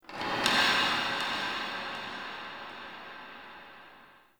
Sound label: Hub Texture J, Ambience, Dungeons, Under City; Silent Rose